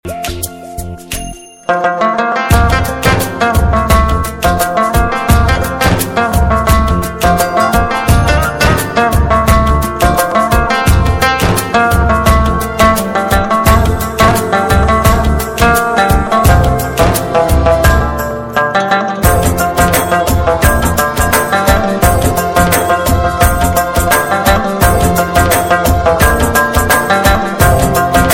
Sajan instrumental

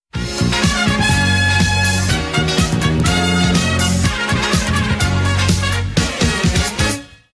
Trumpet intro fast

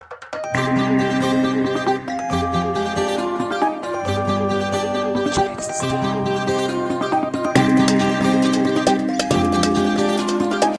Summer Sms Tune

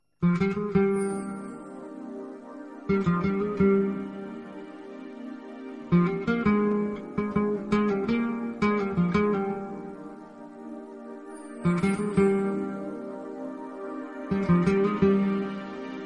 Romantic Music

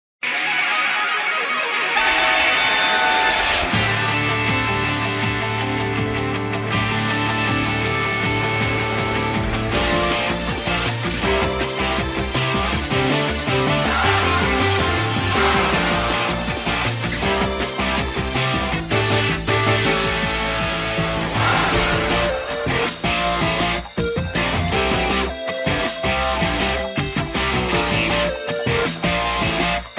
Battle Music; Marnie

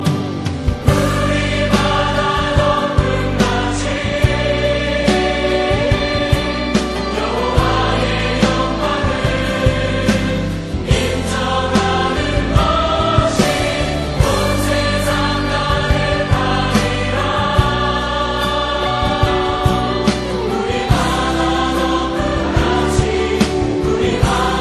Christian Song

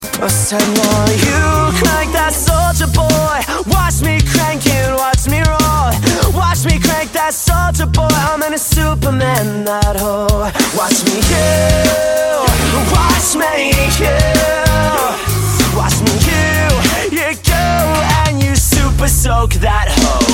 Slam Crunk

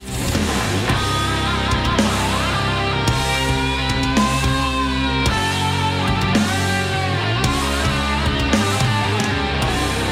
Death metal

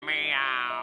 meow; Gary; Squidward

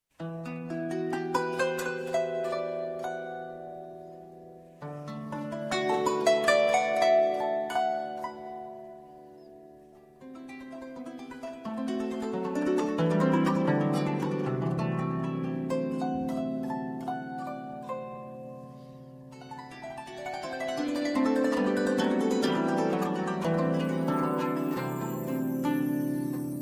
Romantic

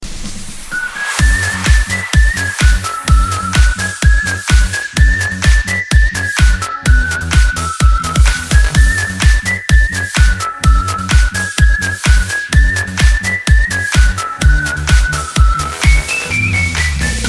Hiphop Whistle remix

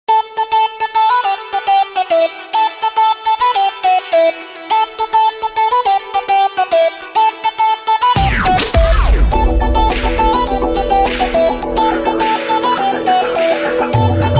Trance Msg

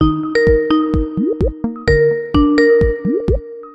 Skype Ringtone

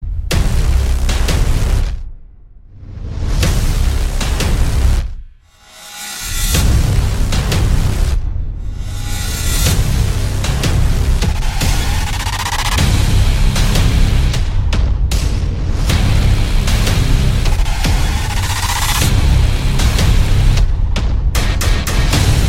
Atmosphere Trailer

